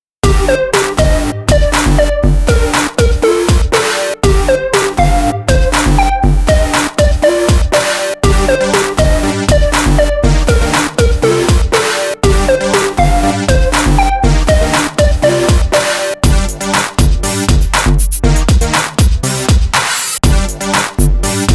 Hip Hop Southern Club 120

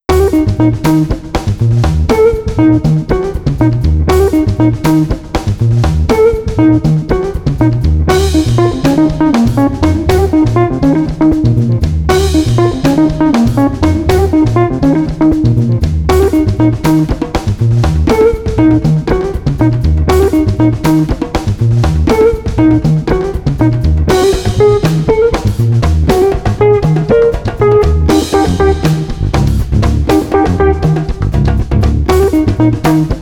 Street Creole Funk Trio

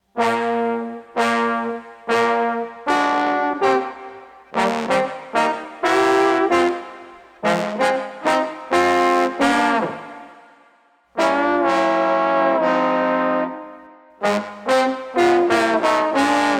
Street Trumpet Trombone Swagger 124

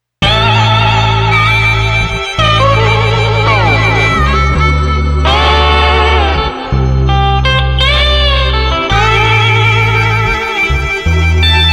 Blues no Drums 166

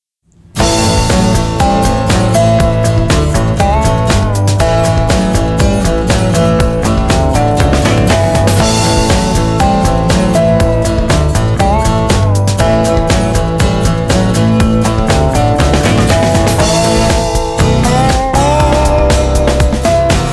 Country Rock

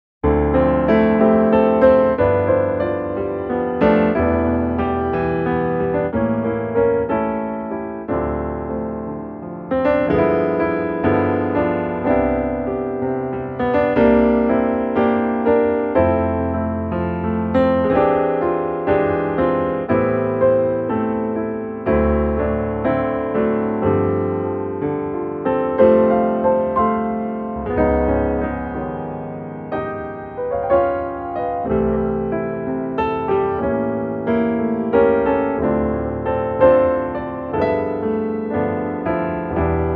Cocktail Piano Sublime 92

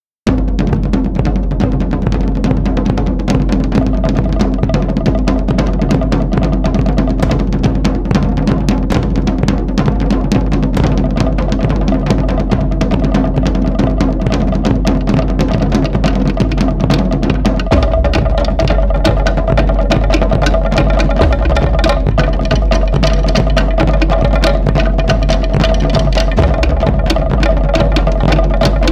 Hawaiian Percussion Luau Wild

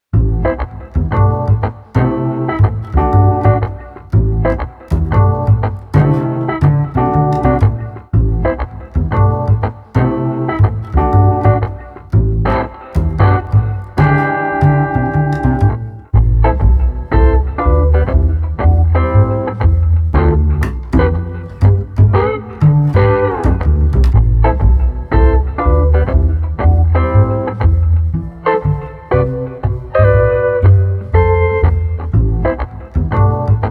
Guitar Bass Jazzy Groove 120

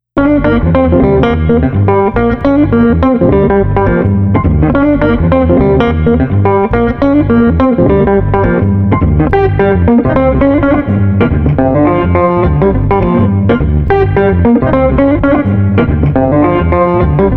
Street Guitar Bass Bouncy 105

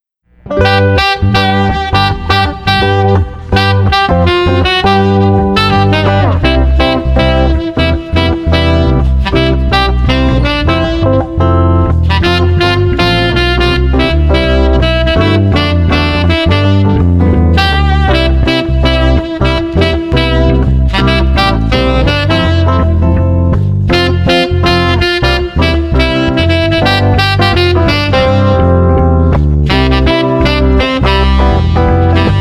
Jingle Bells Jazz no Drums 165

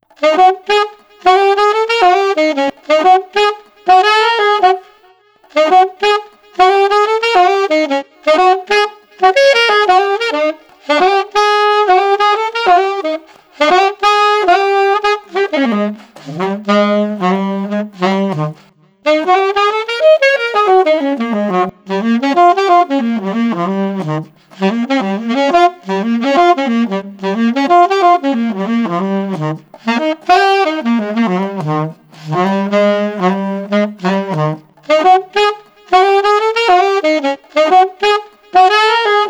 Street Alto Sax Joyous 90

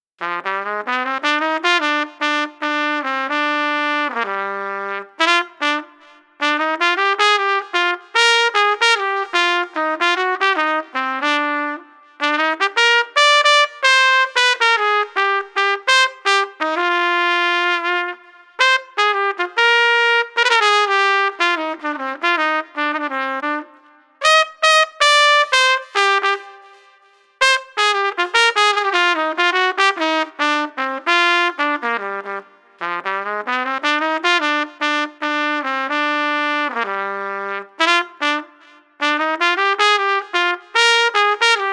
Street Trumpet Chillaxin 75

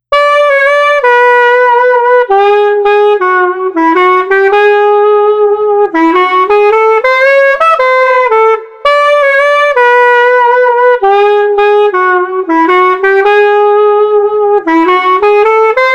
Street Clarinet Swing 110

